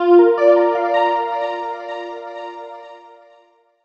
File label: item recover; Happy Birthdays